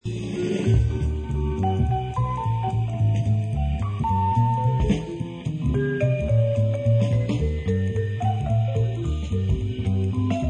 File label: Jazz